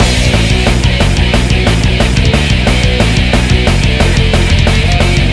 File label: loop